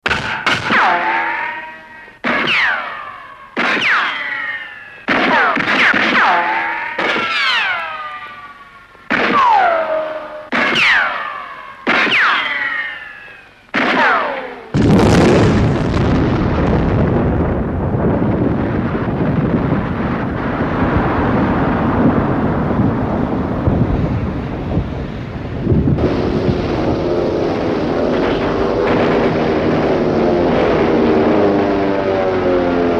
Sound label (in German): geraeusche